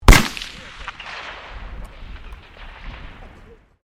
RIFLE 6MMBR FIRING; Rifles, non military; Rifle Sound Effects; Rifles (Sound Effects:Guns)

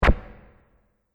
Multimedia Button

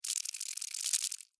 Insect Rattle Wet, Insect, Rattle, Wet, Bug; Animals:Insects; Insect Movement; Blastwave

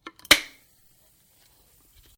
Can Opener Pierce Lid; various metal cans; Metal (Sound Effects:Materials)

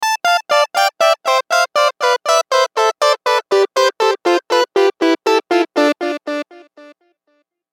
Musical Descend Synth Delay; Synths; Blastwave; Sound Effects:Musical

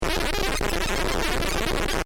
SciFi Spaceship Radio Bad Connection; Communication; Weird Alien Noises; Communications; Crafted Signals

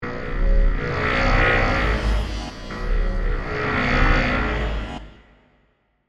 Scanner Beam Heavy, Scifi; Tech

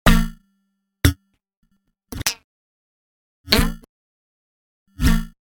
5 Sounds Production Elements, Electronic; Miscellaneous Electronic Sound Effects; Andrew Fleming

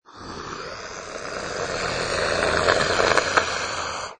Monster Animal Sneer; Monster growl: Monster, fantasy animal or werewolf like creature, snarl or sneer; Lynne Music

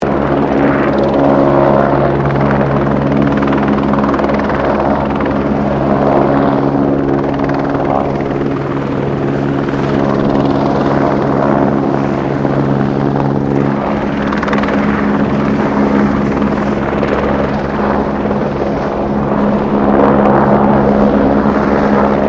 HELICOPTER BELL 204 FIREFIGHTER HOVERING CLOSE; Helicopters; Sound Effects:Vehicles

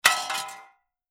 Metal Junk Small Onto Dirt Ground; Small metal impact with rattle, small junk drop.; Metal Sound Effects

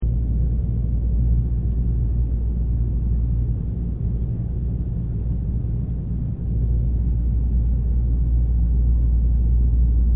Airplane Int Amb Loop; Airplane A74 Int; Airplanes; Vehicles

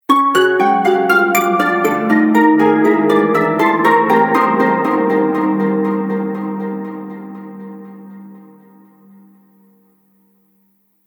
A Haunted Musical Stinger; Ghost Sound Effects; Horror Sound Effect; Andrew Fleming

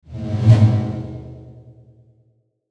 Whoosh Short Fast Flange; Whoosh Short Fast Flange Hit; Blastwave; Whoosh Sound Effects

